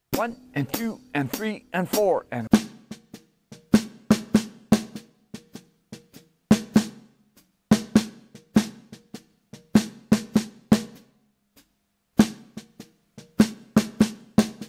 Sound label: Melody Swung 8ths